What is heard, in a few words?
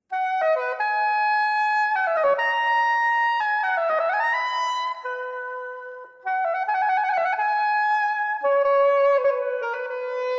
Barock Oboe; Barock-Oboe-Hotteterre